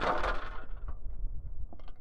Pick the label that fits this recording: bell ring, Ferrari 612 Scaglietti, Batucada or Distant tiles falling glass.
Distant tiles falling glass